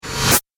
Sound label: Astral Engines Sweep, whoosh